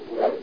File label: book close